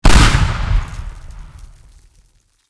explosion; teardown ps5 windows xbox series xs gamerip 2022

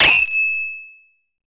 Coin; gondomania; deco8

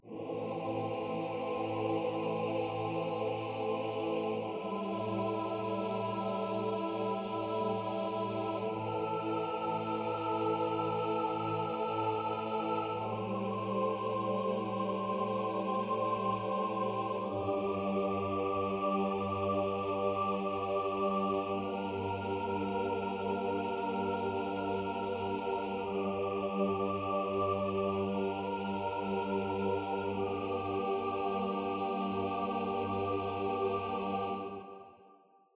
Choir; Temple Layer; tintin reporter cigars of the pharaoh

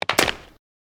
Break Bone Heavy; Osteotic Bypass

